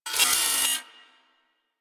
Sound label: Turret Harness Movement